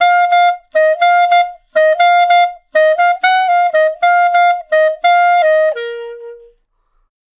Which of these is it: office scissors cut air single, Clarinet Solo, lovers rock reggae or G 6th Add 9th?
Clarinet Solo